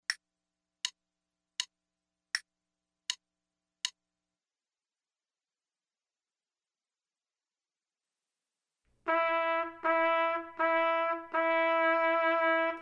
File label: trumpet 2, Maestoso; only the first repeat is taken and the metronome setting is eigth note = 112; Selected Duets for Trumpet, Page 42 Number 8, Maestoso, performed by David Summer.